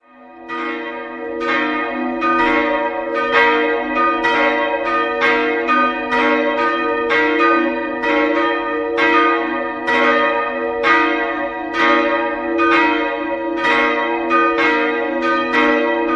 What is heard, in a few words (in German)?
Einmuß, Glocken